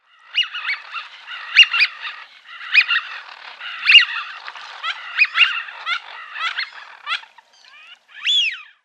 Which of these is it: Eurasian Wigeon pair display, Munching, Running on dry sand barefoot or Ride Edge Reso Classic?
Eurasian Wigeon pair display